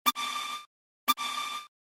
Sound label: Buzz Buzz; yahoo